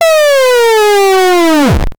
heavy rhythmic stomping; armor

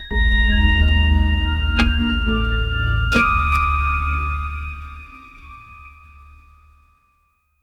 background music mystery

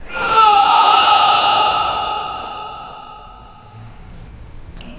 broken whimperin, strained

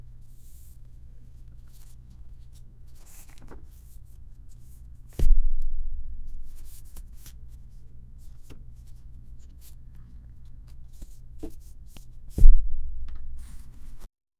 get card from deck

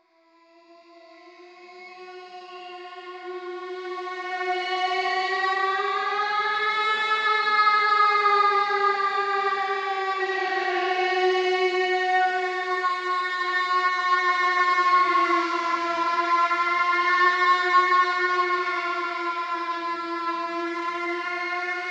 queen penguin scary sound

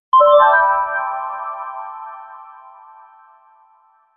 ringtone Xiaomi Redmi, mobile phone

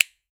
objects

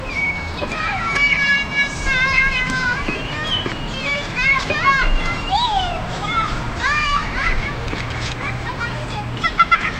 asmr sound distant hiss

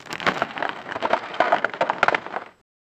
heavy dragging of a fishing

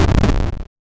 enemy hit pixel

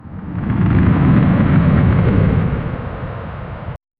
flying over a cliff, aerial

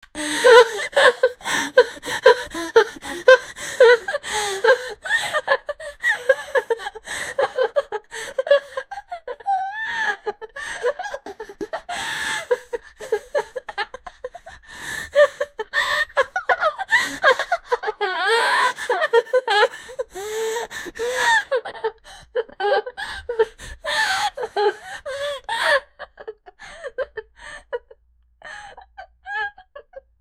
extremely powerful diarrh, bodily functions

